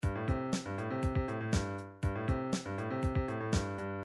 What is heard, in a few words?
drums